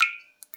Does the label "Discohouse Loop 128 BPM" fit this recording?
No